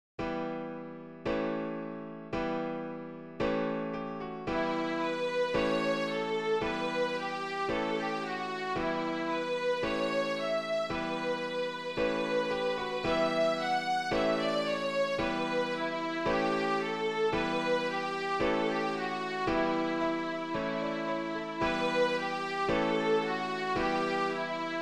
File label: Celtic Canon, Violin Ensembles; Gems for Violin Ensembles